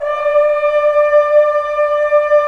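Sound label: brass trombones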